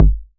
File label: Not Nice 808's